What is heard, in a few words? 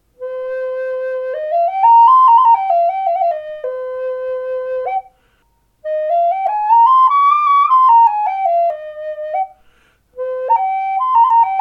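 C5 Chestnut, Native Style Flutes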